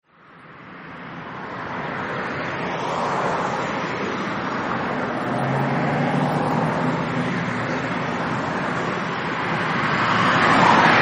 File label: Ambient Sound Of Afternoon Traffic In Northridge California; Traffic and Cars